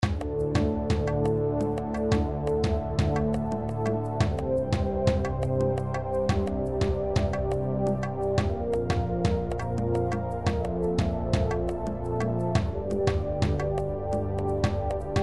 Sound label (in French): batterie savage, midi